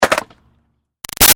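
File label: Skateboard Hit Ollie Snappy; Skateboarding; Sports; Olly; Big Room Sound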